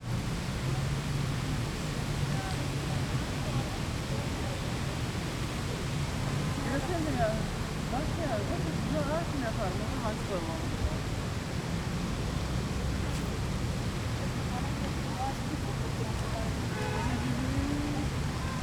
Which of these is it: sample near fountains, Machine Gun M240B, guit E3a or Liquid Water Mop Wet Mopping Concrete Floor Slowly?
sample near fountains